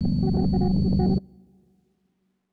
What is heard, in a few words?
low drone; ゆめ2っき